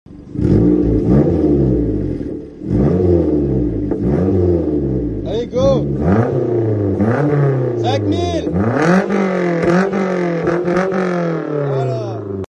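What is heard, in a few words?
Daihatsu, car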